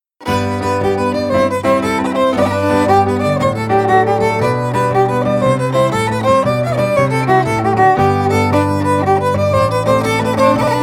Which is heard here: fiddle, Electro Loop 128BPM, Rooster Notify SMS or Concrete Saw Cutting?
fiddle